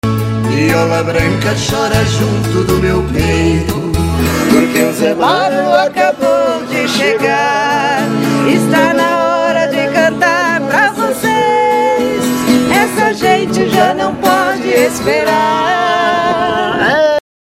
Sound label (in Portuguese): Vinheta Cantada